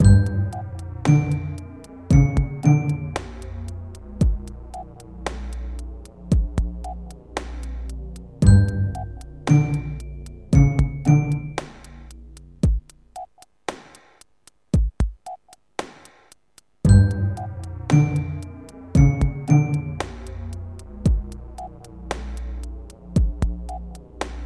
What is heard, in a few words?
The menu music